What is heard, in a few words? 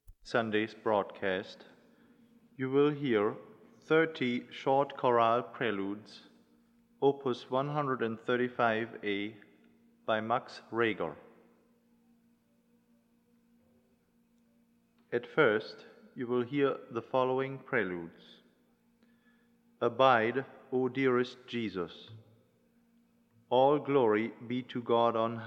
Chorale Preludes; Reger; 30 Short Chorale Preludes; 1 Abide O Dearest Jesus